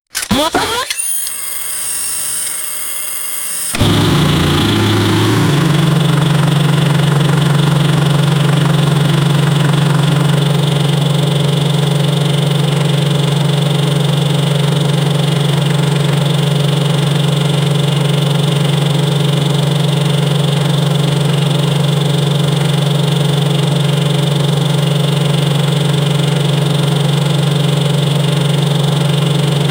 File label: create a realistic v2 engine, intake